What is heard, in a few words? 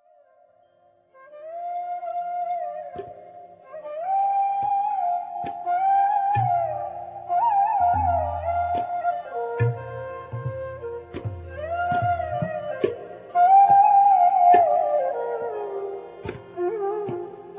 Evening melody Raga Durga